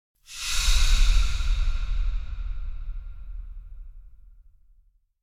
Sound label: Breath Hiss - Distant Hiss Like Reverb; Hollywood Edge; sound designer's toolkit v2